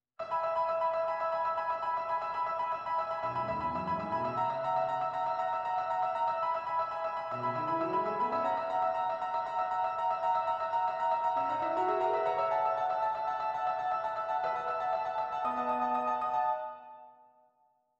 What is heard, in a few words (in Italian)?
Allegro